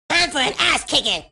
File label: kick; Hacked Hot Wired and Channeling Cartman